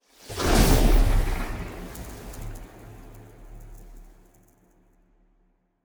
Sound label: ship corvette move; Stellaris; machineage; ships